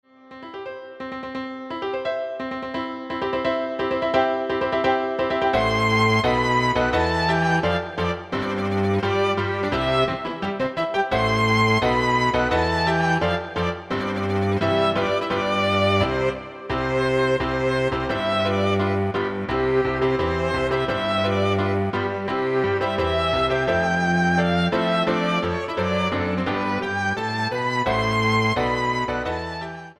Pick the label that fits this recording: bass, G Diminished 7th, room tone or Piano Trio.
Piano Trio